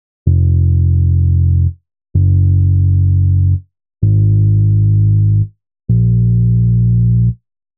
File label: bass